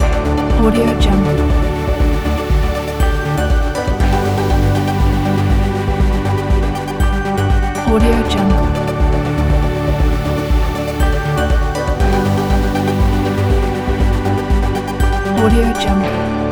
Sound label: ringtone wave